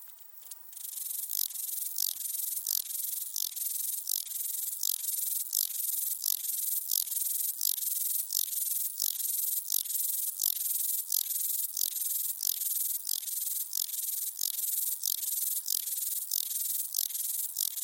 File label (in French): criquet jacasseur; Chant; F. Deroussen, PNE